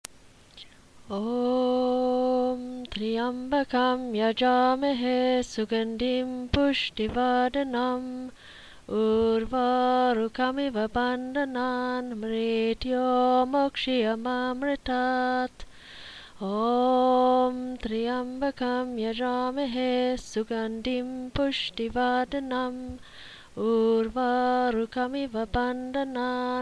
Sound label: Healing Mantra